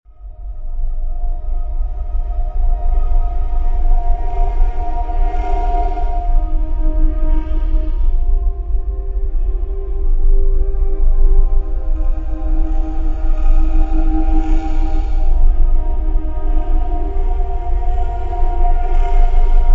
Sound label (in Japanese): ループ; Decrepit Village